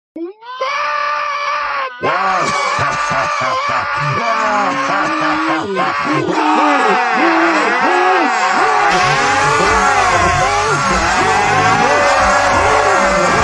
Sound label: screaming